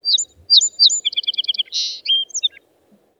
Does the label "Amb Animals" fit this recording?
Yes